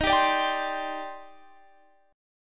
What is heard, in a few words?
D sharp Major 13th D sharp, chord